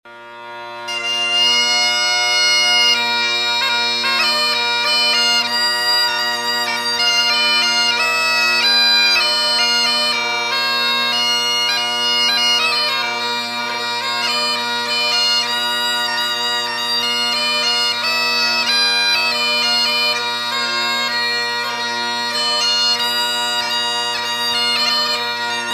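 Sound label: bagpipe; cornamuse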